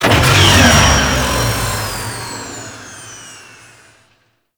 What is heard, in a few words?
Ship Weapon Disarm; Gameplay; Mission Two; Warframe; The New War